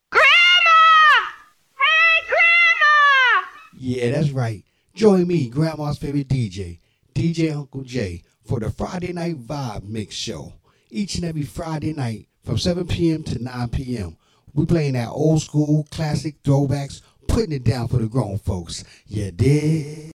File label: vibe drop